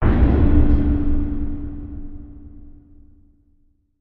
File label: Bass Impact Tone Bel; impacts